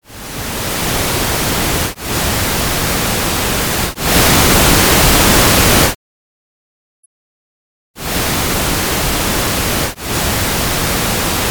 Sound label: Stereo Test